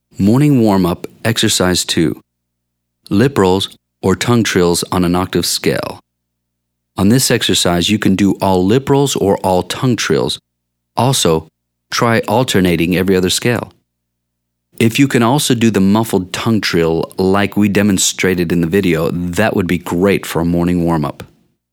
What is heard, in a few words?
Warm Up Lip Rolls or Tongue; Ex 2